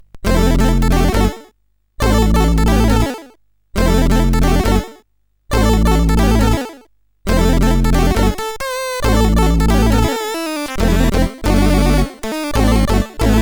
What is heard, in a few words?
Intro sid CSG8580R5